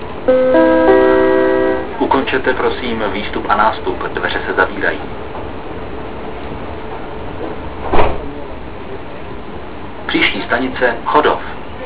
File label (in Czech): odjezd, metro; Ukončete, prosím, výstup a nástup, dveře se zavírají. Příští stanice Chodov